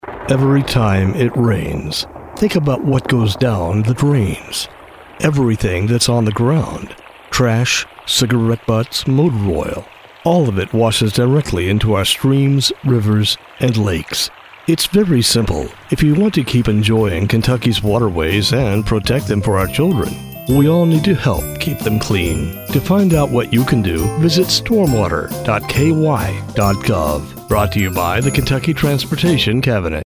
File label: Thunderstorm